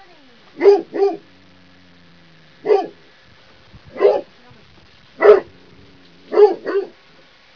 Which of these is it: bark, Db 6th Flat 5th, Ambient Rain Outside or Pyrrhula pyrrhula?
bark